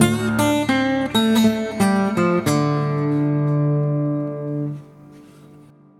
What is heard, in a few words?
Dadgad Riff